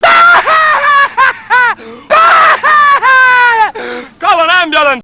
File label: screamer